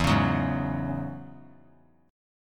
E 6th Add 9th E down; E6add9 strummed; JGuitar

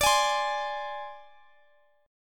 Ab Major Flat 5th D

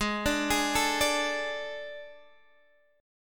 A Major 7th Suspended 4th G sharp

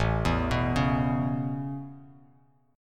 A Major Add 9th A Bass Standard, chord; Aadd9 arpeggiated; JGuitar

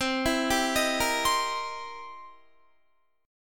C 7th Sharp 9th C Guitar; C7#9 arpeggiated; JGuitar

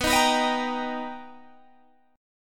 B Augmented Major 7th B Guitar Open G, chord

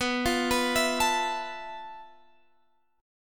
F Major 7th Flat 5th B, guitar chord

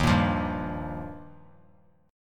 Eb Major Add 9th; Ebadd9 strummed; 15,17,20,17; JGuitar

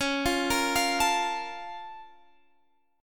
A sharp Minor Major 7th

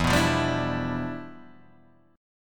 E Minor 7th Flat 9th E

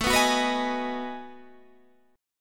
B 13th A, chord

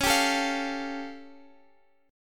A Augmented 7th C sharp

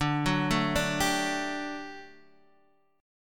G Major 7th Suspended 2nd D

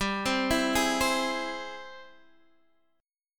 Ab Augmented Major 7th G, guitar

